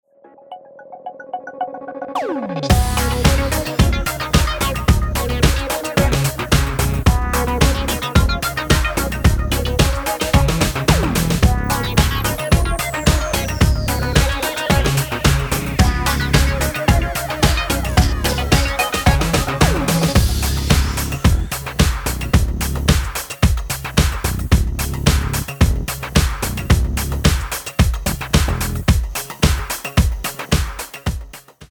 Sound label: Instrumental